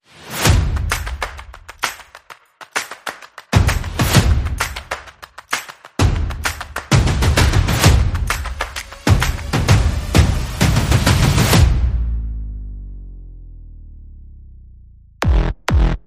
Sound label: Brand Buzz, Percussion Bass and Effects